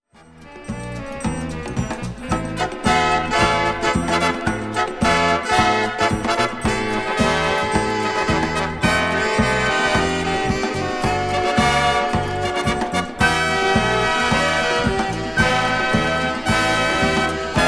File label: Samba